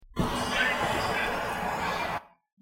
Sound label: Noise Reduced